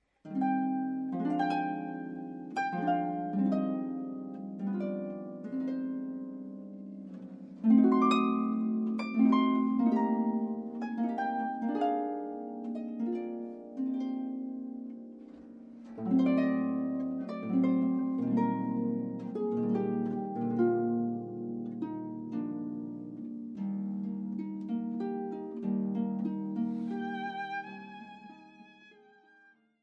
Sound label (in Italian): Sonata in Do Min. per violino Andante - Allegro